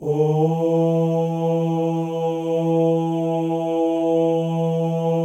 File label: male ohs; Spectrasonics; Symphony of Voices